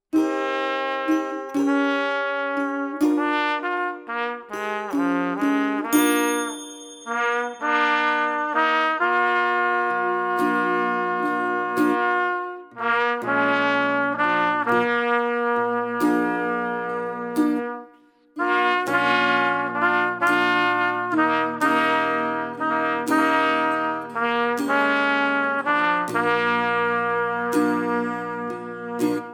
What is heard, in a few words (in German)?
Blues Duett